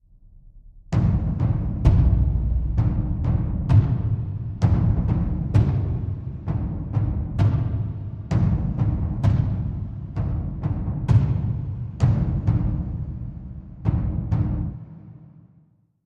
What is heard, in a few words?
Deep Drums